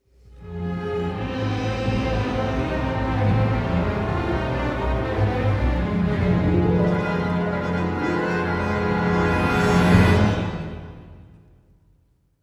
Battles Imperial Lose; Hoth

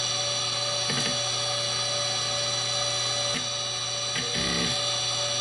boss breath

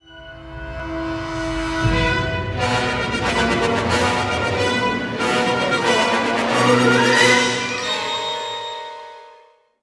Battles Imperial Lose; Hoth

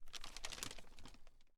News Page Turn

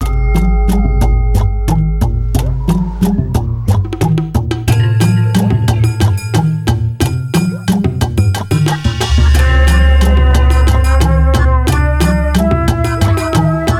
win; reach the helicopter

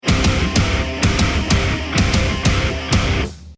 deathcam

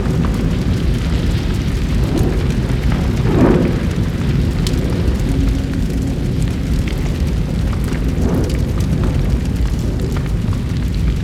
ambience house fire

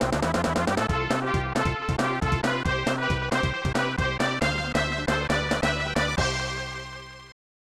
Goal

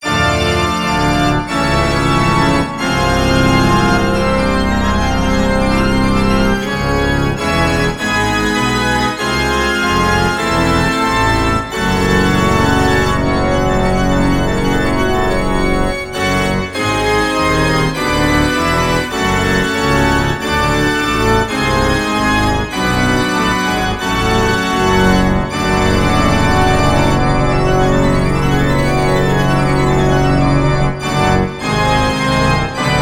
Organ; Monster Madness

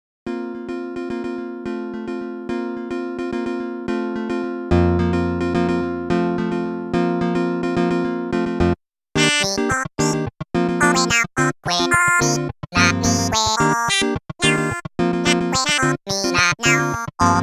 Robot Synth; animal crossing new horizons original soundtrack 2 2022

